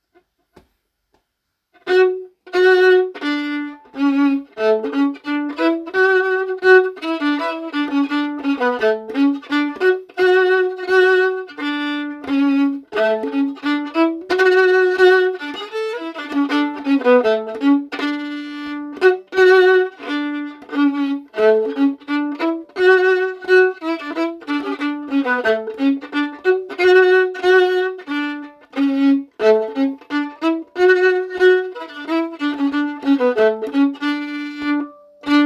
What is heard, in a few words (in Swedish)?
Polska från ön dur 2a stm långsam